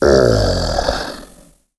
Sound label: zombie die; cstrike